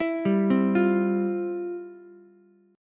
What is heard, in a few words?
F Major 7th Suspended 2nd, Ukulele; arpeggiated; FM7sus2; JGuitar